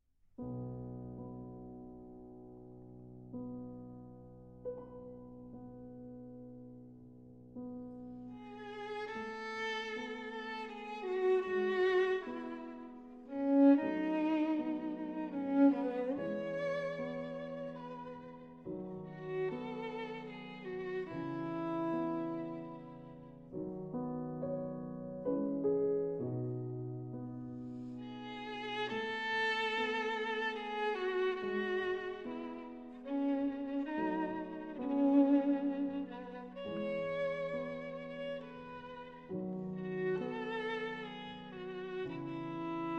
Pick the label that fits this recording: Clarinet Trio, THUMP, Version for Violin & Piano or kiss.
Version for Violin & Piano